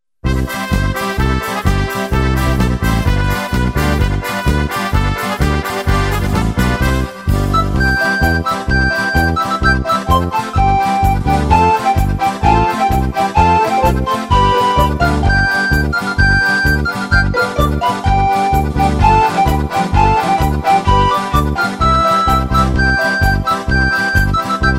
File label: recorder polka